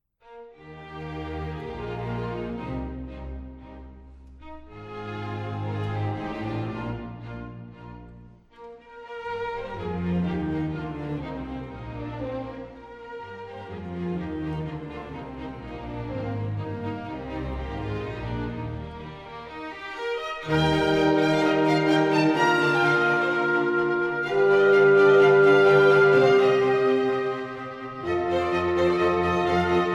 Concerto for Horn and Orchestra in E flat Major, Allegro Moderato; Bohemian Horn Concertos Havlík, Stich-P